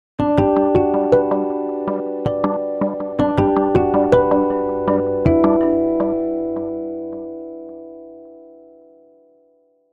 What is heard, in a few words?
Calendar, Nokia Alerts; Windows Phone apps